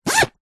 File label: office pencil case zipper fast